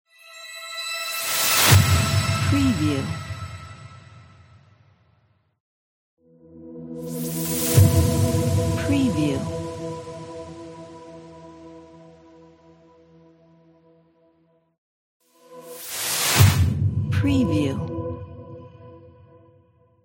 transition

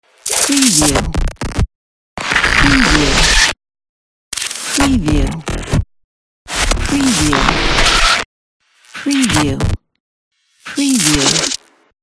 glitch

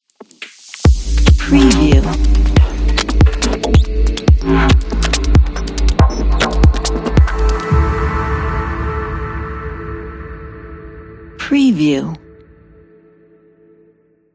digital logo reveal

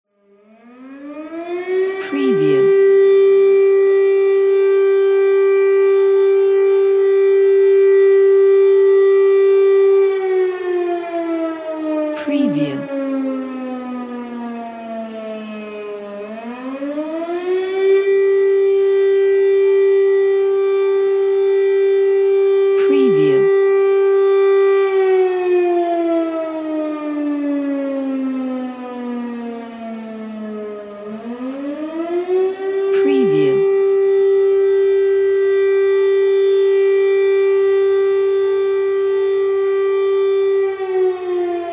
city siren

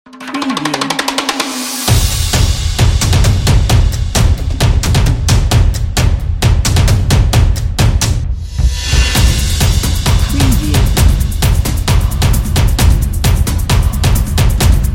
percussion opener

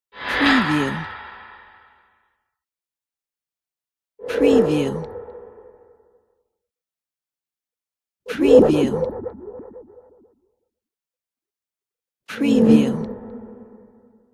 swoosh

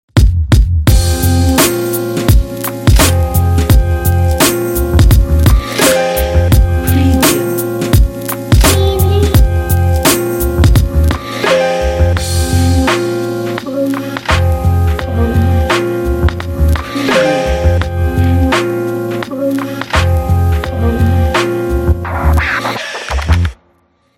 lo fi hip hop logo

